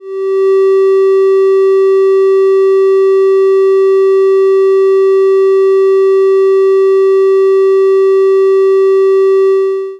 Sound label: 386hz triangle